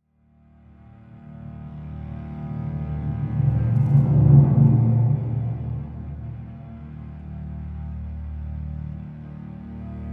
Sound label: Underwater Spores; World of Warcraft 6 Warlords of Draenor